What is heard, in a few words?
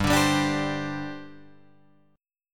G Suspended 4th G down Guitar Open F; strummed; JGuitar